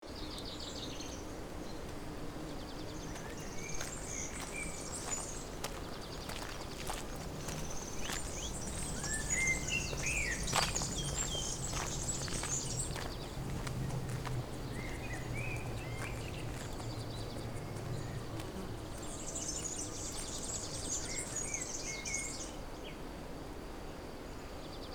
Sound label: Quiet city park ambience birds singing sunny day